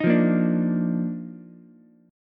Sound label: Eb Major 7th Suspended 2nd Eb Ukulele; strummed; 8,5,6,5; EbM7sus2